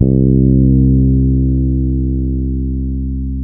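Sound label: C 3, fretless, bass; Spectrasonics; Bass Legends CD 1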